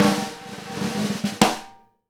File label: snare ROLL HIT, Live Snares; E-MU Producer Series Vol. 8 – Platinum Phatt (CD 1)